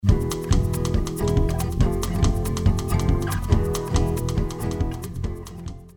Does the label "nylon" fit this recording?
Yes